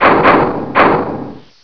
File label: door smack; svencoop; echoes